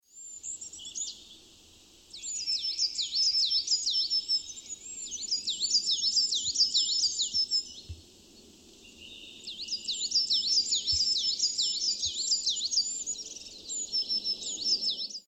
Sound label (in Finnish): kuusitiainen ja hippiainen; Kuusimetsä; laurinmaki